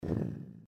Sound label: rocket swoosh